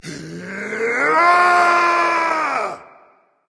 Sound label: battlecry berserker; cuchulainn